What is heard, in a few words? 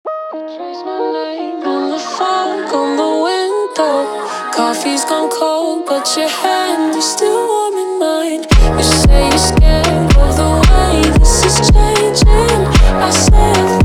Jumpstyle slowed